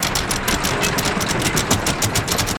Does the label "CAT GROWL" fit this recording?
No